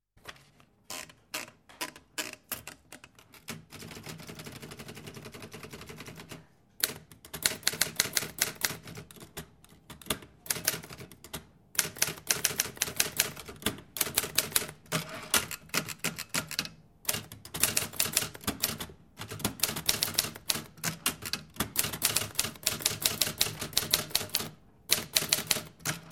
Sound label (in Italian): MACCHINA DA SCRIVERE